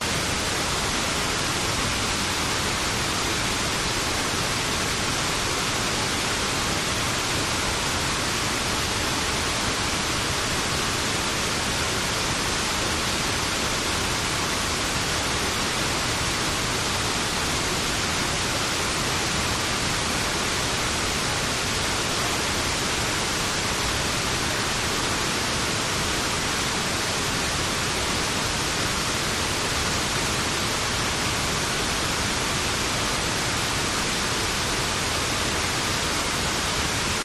waterfall behind medium distant; bridal falls; your free sounds

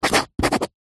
pen rollerball sign signature paper pad; office

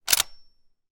gun mosin nagant; still north media

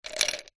ice cubes handful drop into glass; food drink

